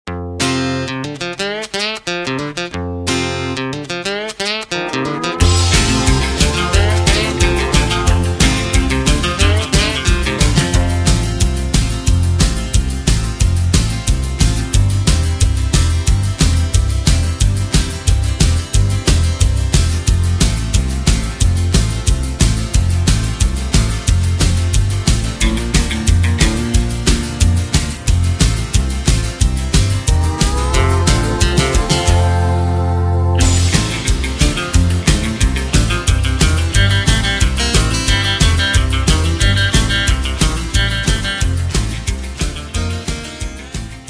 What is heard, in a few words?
F No Backing Vocals, backing tracks